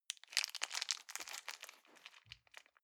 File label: plastic bag small pocket stick in soft